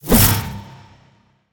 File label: fire; Smite; Vamana